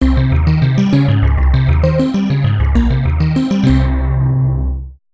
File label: TYPE BASS; eShop